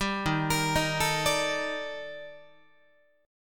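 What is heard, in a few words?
E flat Major Sharp 11th, Guitar; EbM#11; arpeggiated; 10,11,13,13,13,13; JGuitar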